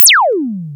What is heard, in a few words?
POLYMOD; AMG - Vince Clarke Lucky Bastard